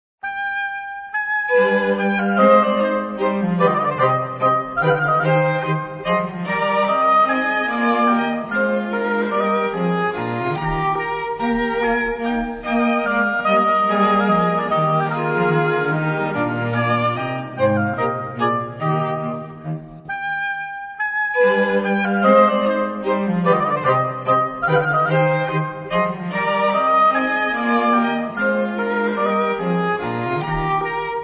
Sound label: minuetto in canone